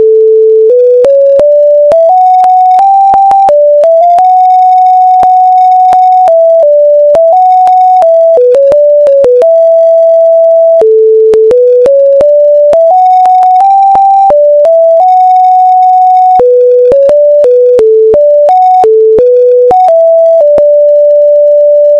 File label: Hail Normal